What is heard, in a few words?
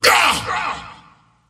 pain; skeleton king